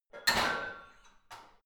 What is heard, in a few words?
barbell heavy plates lift rack, fitnessstudio